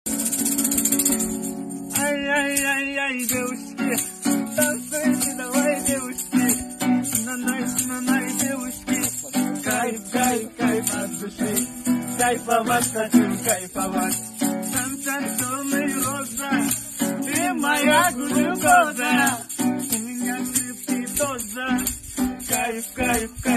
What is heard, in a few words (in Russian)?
gitara cover